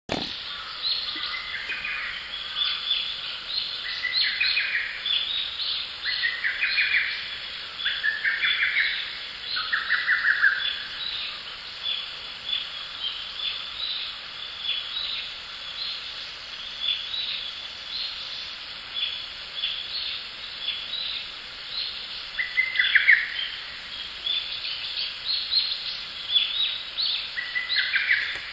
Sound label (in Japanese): ホトトギス; 遠藤